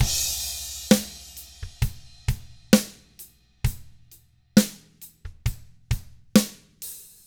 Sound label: SLOW ROCK, Drums; 66SLOWROCK